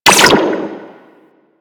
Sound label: Sentinel Laser Rifle Fire; DE; Warframe; Weapons